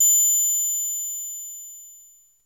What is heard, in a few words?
PERC; ZERO-G; Datafile